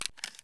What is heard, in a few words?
mr133 shell pickup; Escape from Tarkov